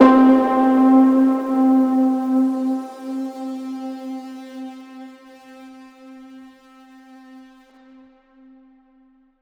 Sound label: Synth C, Lo-Fi One Shot; Melody Kits; Ghosthack; Memories 78 Em; HipHop